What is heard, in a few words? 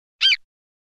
animals